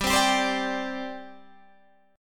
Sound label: G Suspended 4th, Guitar, Half Step Down